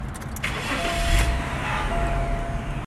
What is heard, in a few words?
metallic sound startup, jag startup; XJS X27